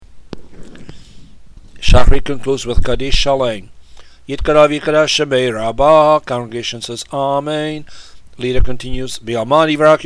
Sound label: kaddish shalem; shacharit shabbat